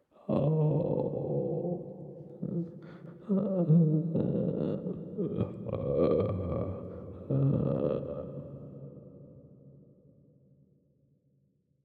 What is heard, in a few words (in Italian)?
lamento uomo; Inquisitor 2